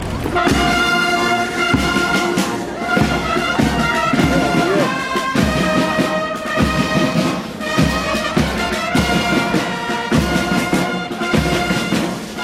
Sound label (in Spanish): Fanfarria